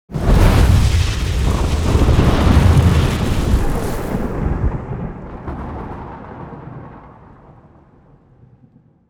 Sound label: near Win Riser; netent